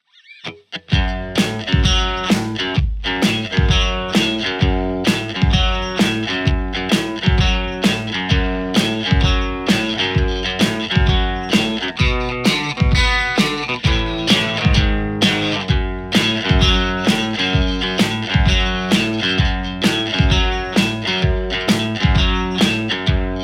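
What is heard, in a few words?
Stratocaster JCM800 hot; mercuriall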